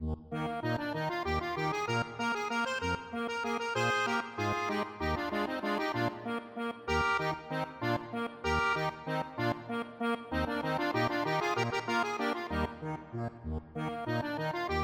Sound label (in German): Zwiefacher; accordion; Eisenkeilnest